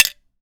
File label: Grenade Bounce; zps; Weapons; Explosives